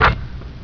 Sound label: HOOP